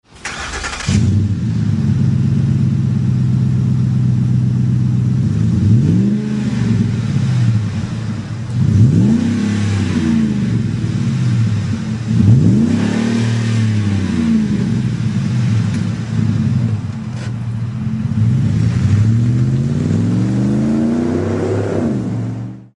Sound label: magnaflow exhaust systems